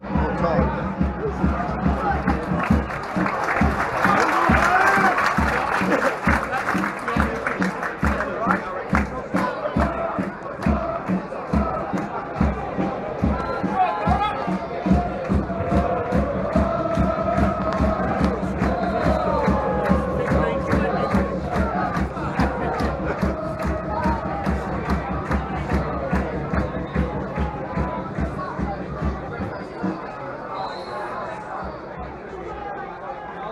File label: with drums; stevenage v tranmere